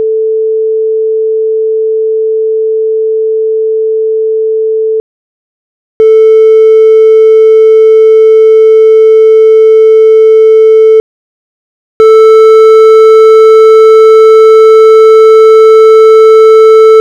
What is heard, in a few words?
storm sounds clipping